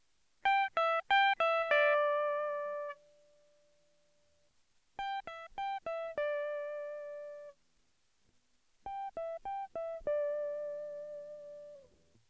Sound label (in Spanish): Tono, Guitarra